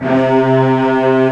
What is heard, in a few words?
STR SEC C2, STRING SEC